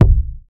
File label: boom bass drum single hit A key, kick tuned to the A note; kick drum samples